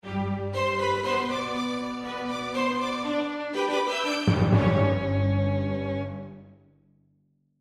Composition Bright Orchestra; Musical; Blastwave

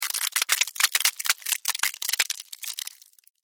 Weather Ice Crack; Snow

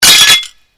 GLASS SHARD SMASH; Material components for constructing more complex sounds

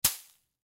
Impact Sand Hit Ground; Debris; Materials; Sound Effects:Materials; Blastwave

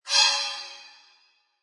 Flange Hit; Scifi Production Elements, Set 1: Flange Hit Whoosh

